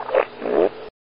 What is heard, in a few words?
just another fart